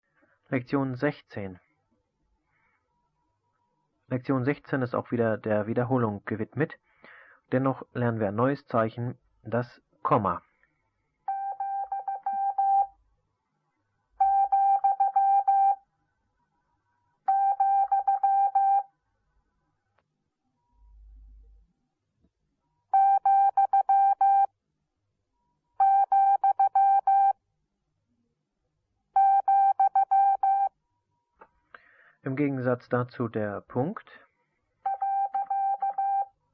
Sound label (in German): Morsen; Onlinelehrgang